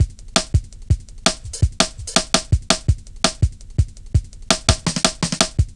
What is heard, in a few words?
Rim Shott, Drum Loops 166.5; Zero-G Jungle Warfare 1, 2 & 3